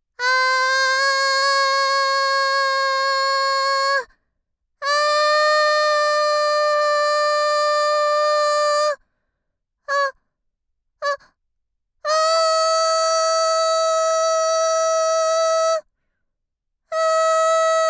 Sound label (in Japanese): あーーーーーーー